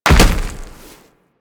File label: Land Heavy Dirt, Footsteps; Warframe; Tenno